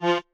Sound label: marimba chainsaw; ゆめ2っき